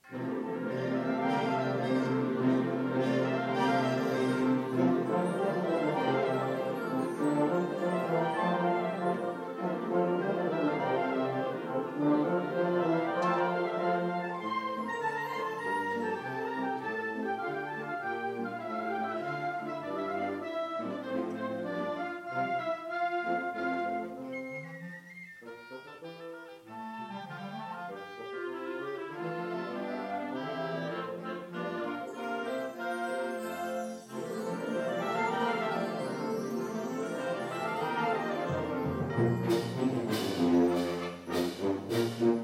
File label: Ding Dong Merrily on High